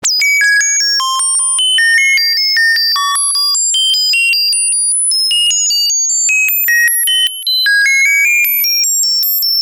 Computer Heart

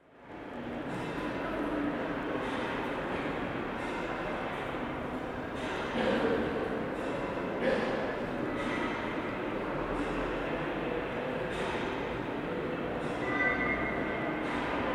Museum Ambience; British

